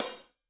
Hihat Half Open

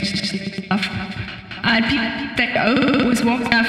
ELECTRO SET; Sony; ACID Pro 6.0

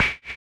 Snare, drums; Ableton Live 12 Lite Core Library

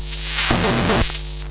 Hum and Hiss 90 bpm; Ableton Live 12 Lite; Core Library; Swepdustry

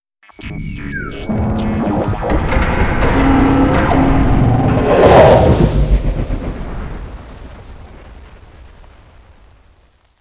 Cave Maze Guardian Activat And Leave; Journey

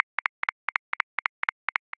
Boogie Early Snare Heavy Swing 16ths; Grooves; Ableton Live 12 Lite; Core Library

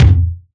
Tom; Ableton Live 12 Lite; Core Library; Drums

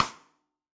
Rim Sidestick Direct Injection, Drums

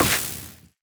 aether weak; action; impact

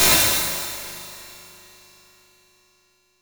IR plate, Reverb; Image-Line; FL Studio 9; Fruity; Edison

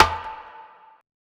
Tube Hit; Drums; Misc Percussion

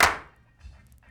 Clap; Ableton Live 12 Lite; Core Library; Drums

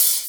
Hihat Open

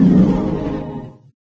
hoverpack nitro; There; ThereClient

